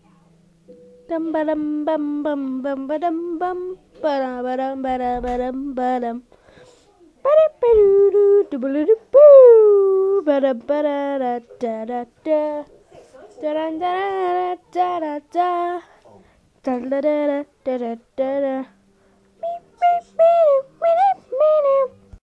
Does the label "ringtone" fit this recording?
Yes